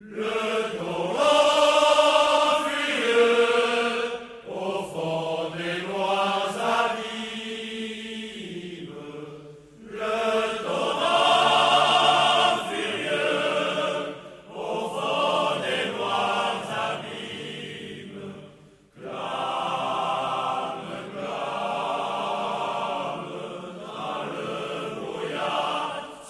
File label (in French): le chant montagnard